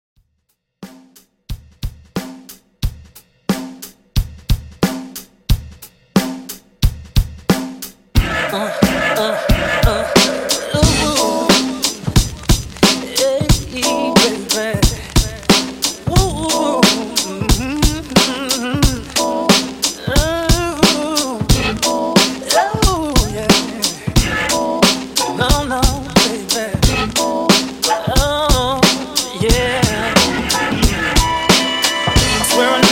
90s RnB, 90 Bpm; ReDrum

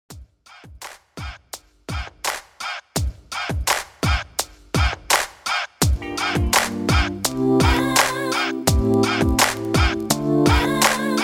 Saxophones 84 BPM; REDRUM

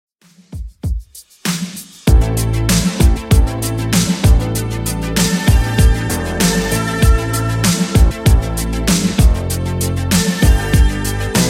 80s Redrum 97bpm

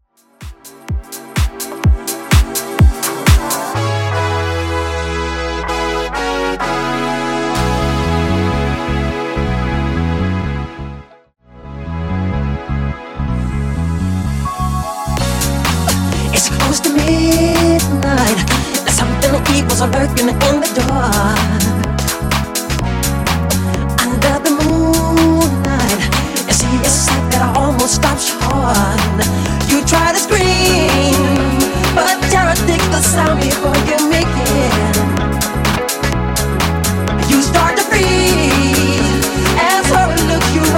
House 126bpm

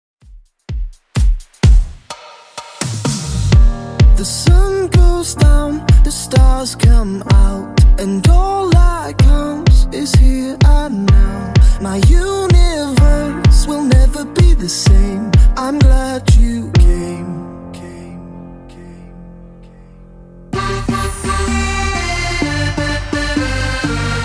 Top40 DJ Friendly Redrum 127bpm CLEAN

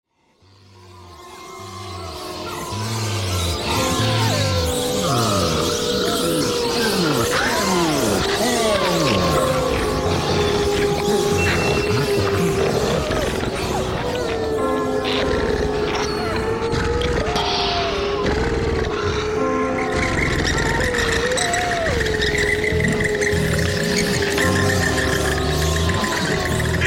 Top40 Halloween Latino 122 Bpm

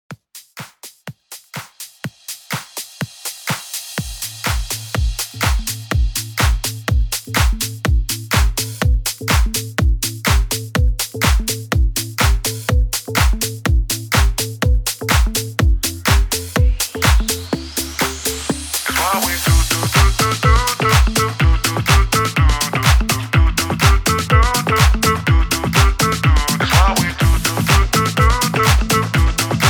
HOUSE MIX 124 BPM CLEAN

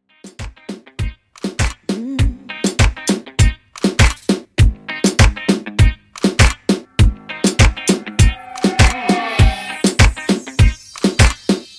R B Reggaeton 100Bpm

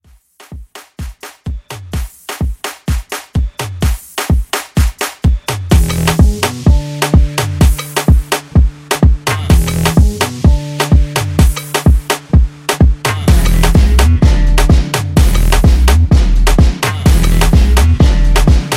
Extended Rework; Remixfreakz

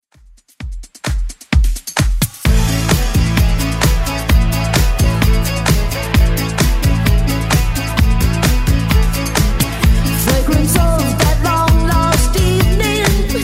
80s Club 130 bpm

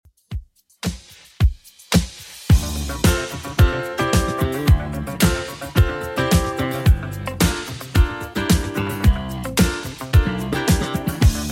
80s Redrum, 110 bpm

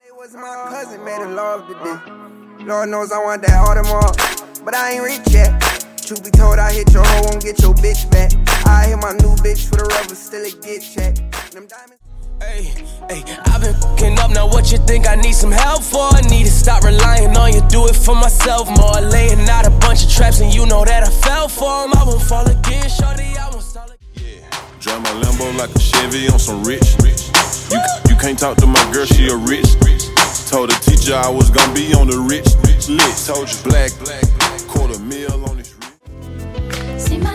Hip Hop RB Pack; Remixfreakz

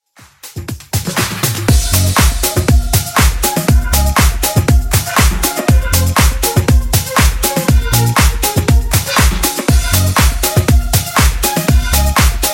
Disko Funk Remix 120 bpm

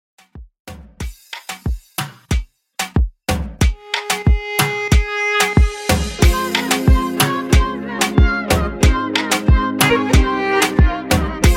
Moombah 92 Bpm; Dj In Outro

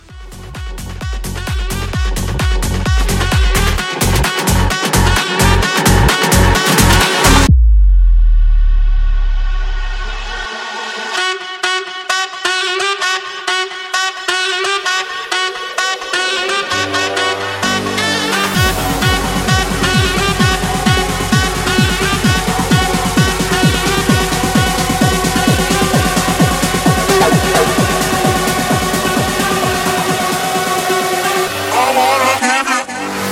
House Bootleg; 2024 House Bootleg Edit

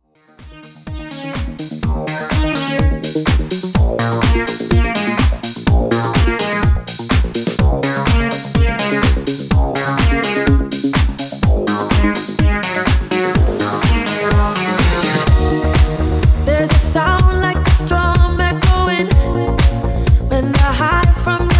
Club Extended 125Bpm Clean; djyan

